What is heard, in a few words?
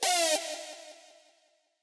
stab